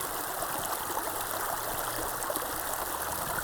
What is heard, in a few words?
Waterway G Dark